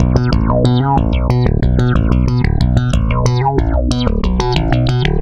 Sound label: Stick Loop, Stick Bass